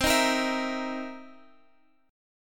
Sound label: C Minor 7th Flat 11th, C, chord; strummed; Cm7b11